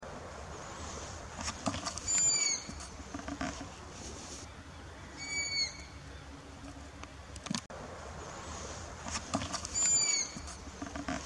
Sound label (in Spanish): Canto ave nocturna, Coruja-orelhuda (Asio clamator); Eran dos, deduzco que eran una yunta.